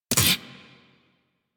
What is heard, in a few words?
Shotgun Alt Mech; Weapons; Revenant; Warframe; Tenno